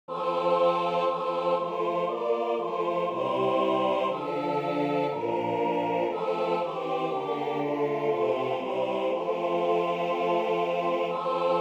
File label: soprano; Lo How a Rose